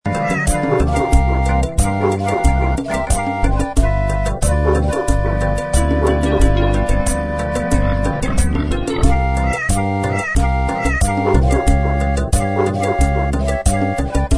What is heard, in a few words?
blues